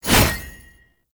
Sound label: gunbrella aim transition out; Weapons; Warframe; Tenno; Koumei